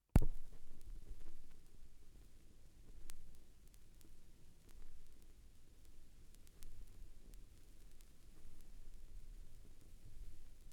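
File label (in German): schallplattengeraeusche, record noise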